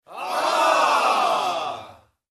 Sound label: Crowd Disgust reaction